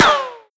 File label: npc armor, bullet impacts; physics; Half Life Model Pack